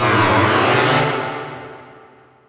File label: APPEARANCE; deltarune yellow translation